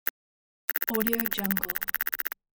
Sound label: digital text; ufosounds